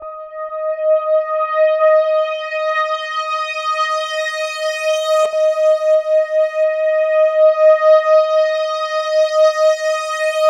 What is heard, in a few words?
Driving Synth